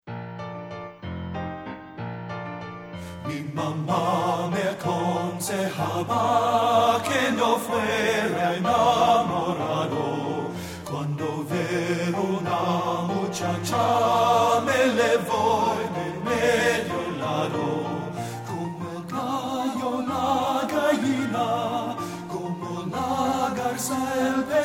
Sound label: BASS; men